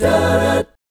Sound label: F# MI7, guy chords; Spectrasonics; Vocal Planet; Jazz FX